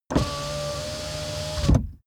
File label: car window back close part